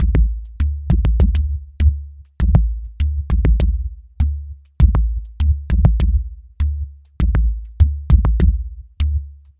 Timber Land, Ethereal Textures, Beats; Sonic Foundry (Sony Creative Software); Ma Ja Le Ethereal Textures

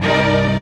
STRINGS; Houseworx

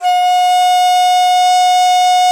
DIZI, Winds; E-MU Producer Series Vol. 7 – Old World Instruments

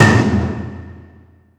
movie, impacts & hits; Techno Trance Essentials

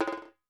WALK DRUM, African Drums

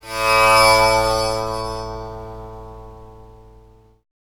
TAMPURA; Spectrasonics; Heart of Asia

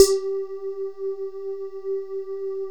BOUNCE; Masterbits; Vintage Voltage; SQ+FX

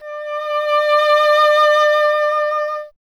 E. Horn; Miroslav Vitous; Solo Instruments VOL-2; Solo lnst. II

